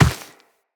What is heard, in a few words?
Walk Dirt, Footsteps; Operator